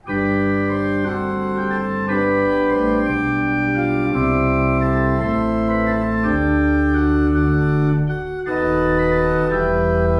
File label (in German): Walcker, gebrauchtorgel